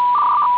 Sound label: fsk; Digital Signal Processing